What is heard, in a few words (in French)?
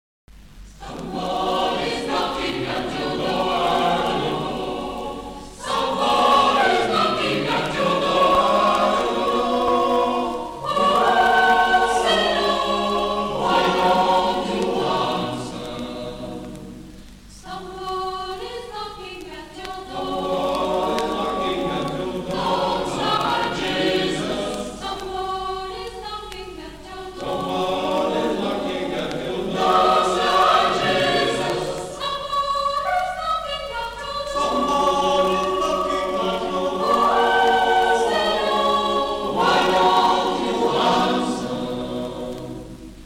Chorale